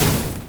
range projectile impact; weapons; dota; creep

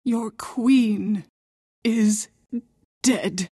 pain death; queenofpain

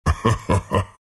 treant laugh; dota

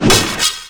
run drag; weapons; dota; skeleton king

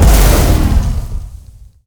flamebreak impact; weapons; dota; batrider

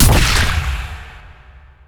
spear target; weapons; dota; mars

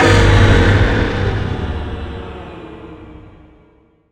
stun, weapons; dota; dark willow

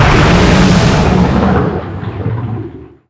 sharkship impact; weapons; dota; kunkka

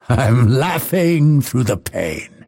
deny pain; dota; phantom assassin

